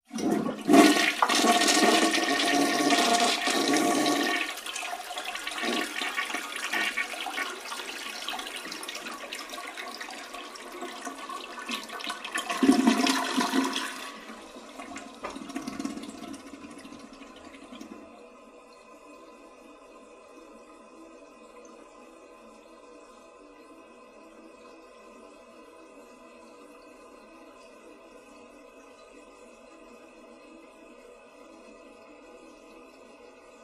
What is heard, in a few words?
toilet flush fill